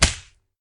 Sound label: Whip